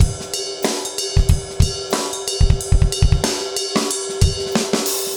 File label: urban grooves 90